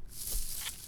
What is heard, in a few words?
Crinkle Plastic; BB Retail Therapy Sample Pack